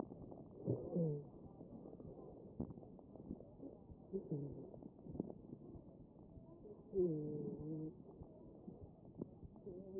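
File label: anterior chest, position 1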